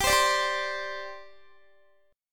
G Suspended 4th, Guitar; Gsus4 strummed; x,22,22,19,19,19

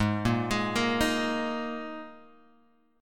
Gsharp Suspended 2nd, Guitar, Open G; 6,3,6,3,4,x

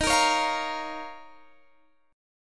Eb 7th Suspended 2nd Eb down Guitar Standard; strummed; chord

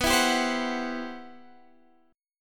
C-Major Sharp 11th B, guitar

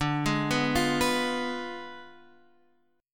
D Diminished 7th D, Guitar, Open F; Ddim7 arpeggiated; JGuitar

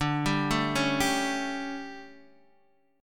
D-Major 7th Suspended 4th, D; arpeggiated

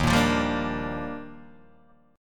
Eb Major 9th, Guitar DADGAD

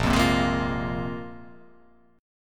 A sharp 7th Suspended 2nd down

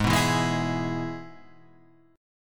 G sharp Minor Sharp 5th; x,x,6,4,4,3,6,5

